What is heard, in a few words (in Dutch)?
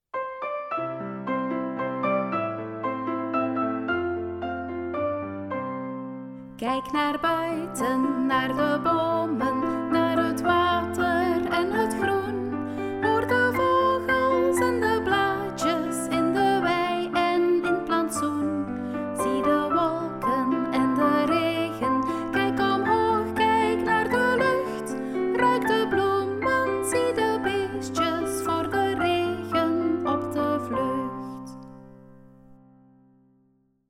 vlaams zang